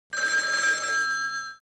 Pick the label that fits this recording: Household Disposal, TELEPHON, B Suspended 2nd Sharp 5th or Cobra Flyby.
TELEPHON